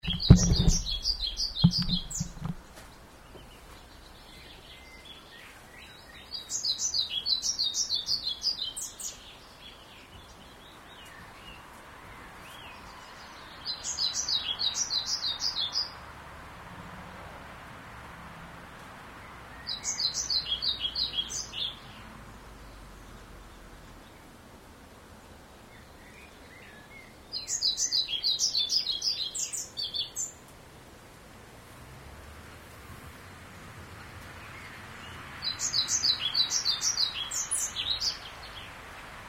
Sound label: birds and cars; fieldrecs